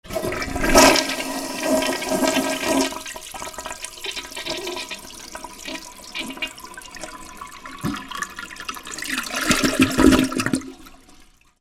BATHROOM

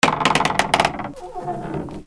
DOORS

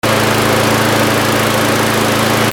GARAGE

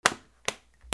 OFFICE

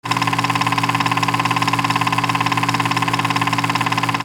CAMERAS

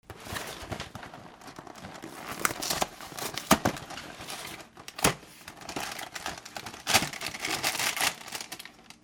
KITCHEN

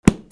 PAPER

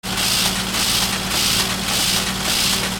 INDUSTRY

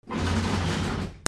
FRICTION

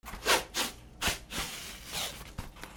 RIPPING

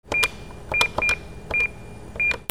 ALARMS

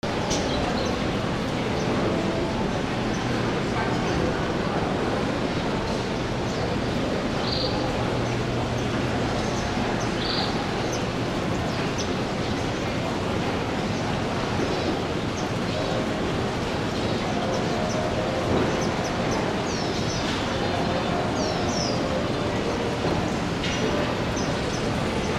AMBIENCE

